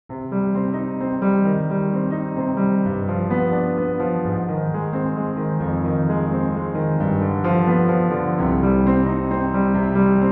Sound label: Clementi variation 3 beat